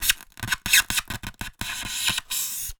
Polystyrene Squeak

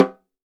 Snare Drum; Percussion Samples

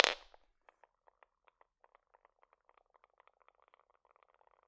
Set On Floor, Medium Wine Glass

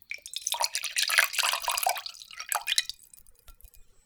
Dribble, Water Dribble

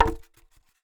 Polystyrene Knock

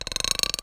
Bolt Base Rub, Medium Wine Glass

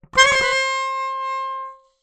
Ornament, Melodica

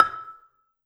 Gambang Hard Beater, Gamelan

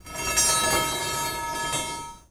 Digging Bar Drag

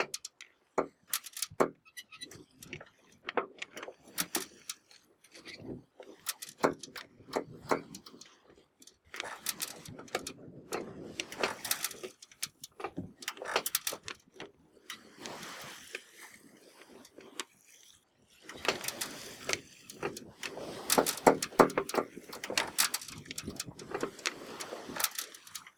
Sailing

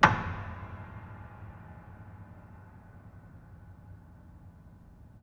Hamm Perc Ped, Piano Hammer Perc Ped

